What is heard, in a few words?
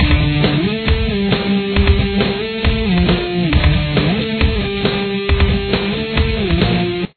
guitar